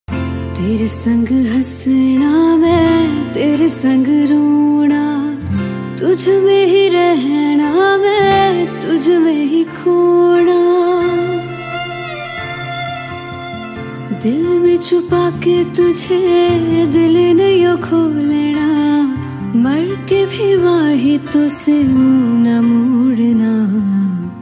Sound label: female version hindi ringtone